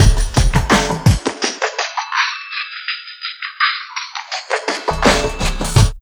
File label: FILTERS L, Dark Side Of TripHop; USB Soundscan vol.35; AKAI